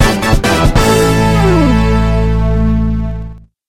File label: gameover, themes; microtf2